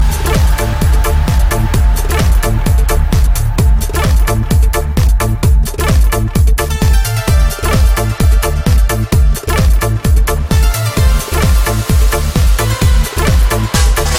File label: Oriental House